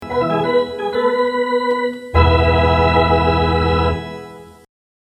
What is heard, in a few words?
Charge Short